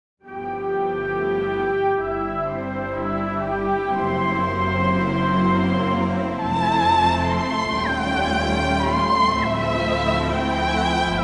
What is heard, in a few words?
C orchestra; orchestral; key - C - vocal range - C to E; Stunning arrangement with orchestra, wonderful choir and beautiful operatic obligatos!